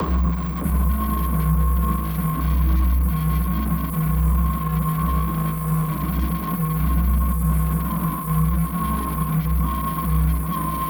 Dansa Dansa

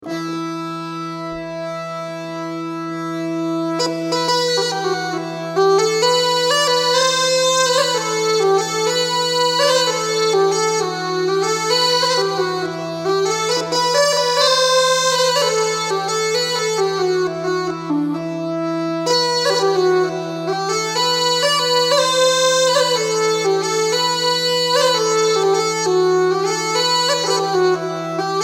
Marsch, bagpipe fiddler; Grabbarnas intåg i medeltiden

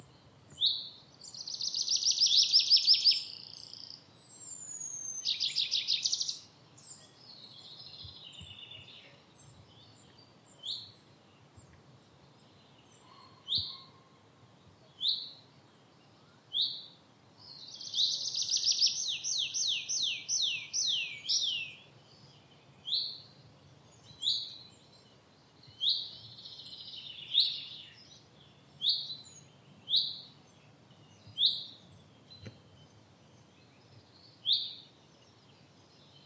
Trädpiplärka

